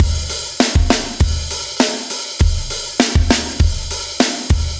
Walker Drums, метал

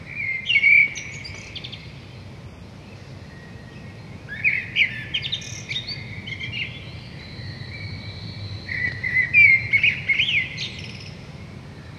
Morning Bird

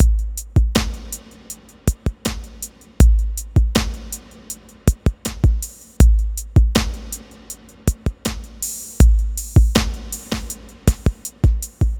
Cellular, ambient

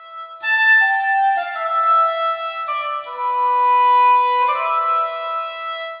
Engl Horn

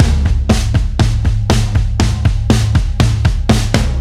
Heavy Groove, rock

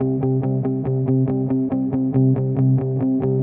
Nation Guitar, guitar trap; гитарный аудио сэмпл в стиле trap

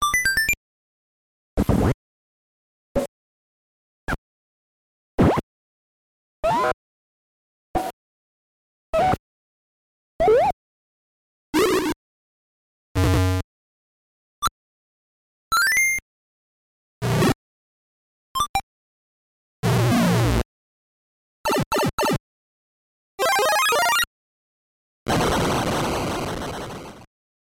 effects; super mario bros 2; марио

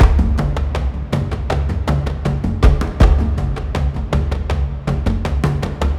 World Drums D; сэмплы ударных; звуки для фильмов

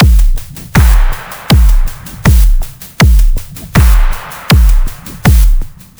Stomp A, beat

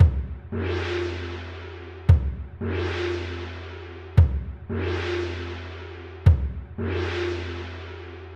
Japanese Drum Cymbal 115 BPM

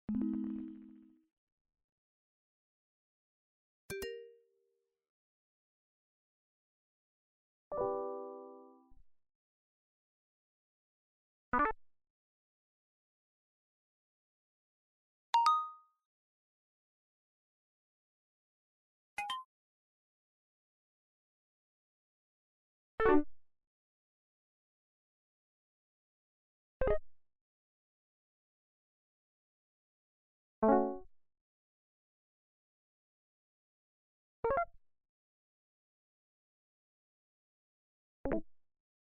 notify; Оповещения

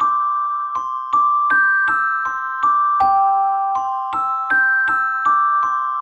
Celesta; молоточки

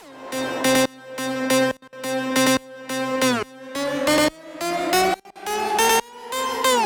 Snow Lead, Trap; трэп

